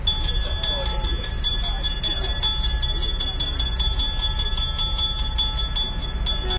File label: tram bell